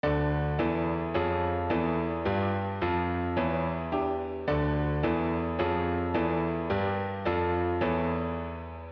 chorus bosendorfer; boss music

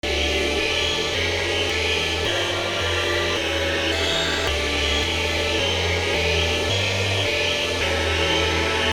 chorus synth; boss music

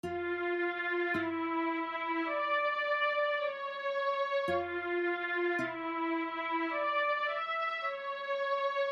chorus guitar strings; boss music

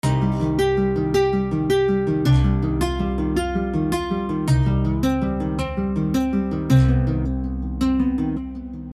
verse guitar; boss music

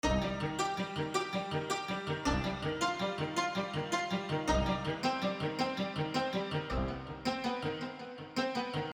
stem verse dulcimer; boss music

